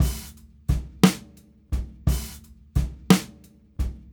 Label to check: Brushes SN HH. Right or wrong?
Right